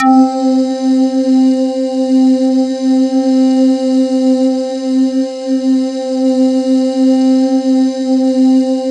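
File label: BAMBOO VOC, Kurzweil K2000; BASIC-KW2000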